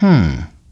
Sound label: "HMM"